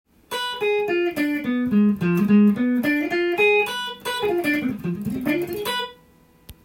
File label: dim picking, sweep picking with diminished chords, guitar